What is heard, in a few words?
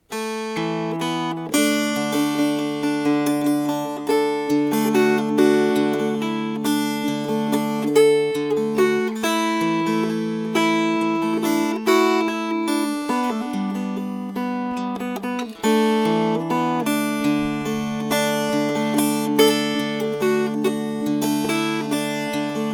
Fingerpicked; Mingulay Boat Song